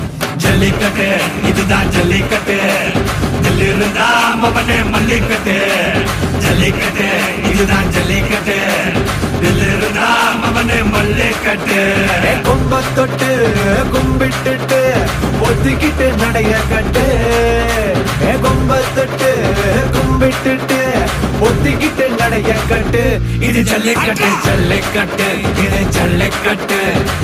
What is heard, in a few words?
Malayalam Song Ringtone; Jallikattu